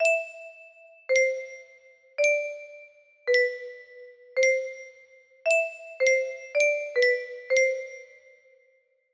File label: jingle